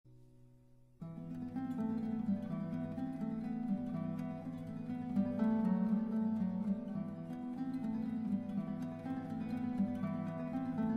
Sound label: Flamenco Minimal, guitar